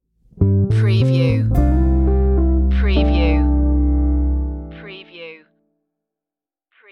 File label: jazzy love stinger